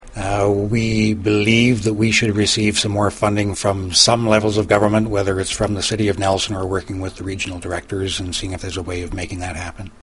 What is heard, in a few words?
Tom